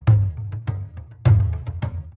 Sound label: enemy turn; heros web